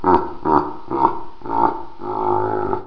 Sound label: slow laugh